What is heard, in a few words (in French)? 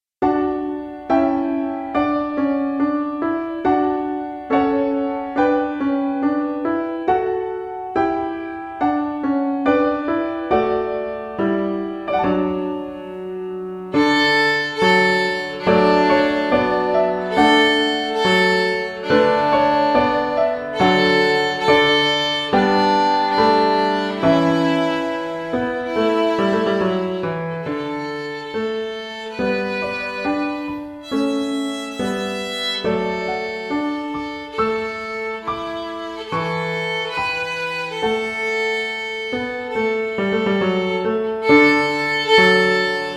Violon et Piano